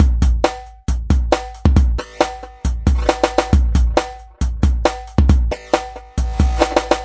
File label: 136, drum loops; 131 to 140 bpm